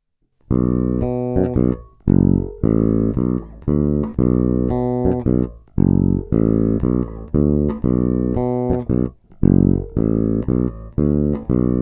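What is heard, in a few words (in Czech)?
Snímač u kobylky, Jazz Bass; Sadowsky NYC Ultra Vintage J Bass Guitar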